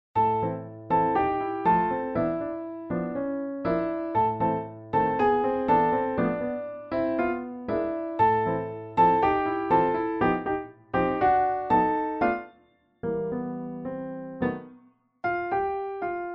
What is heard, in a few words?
tango; recital pieces